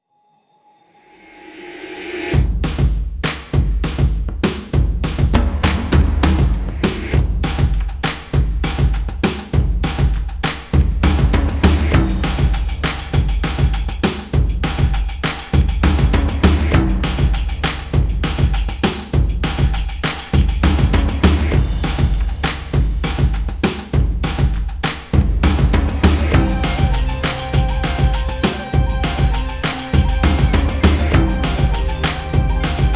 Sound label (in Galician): música